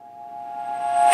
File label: musicbox